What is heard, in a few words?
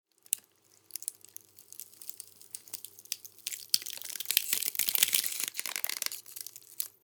Clean ice cracks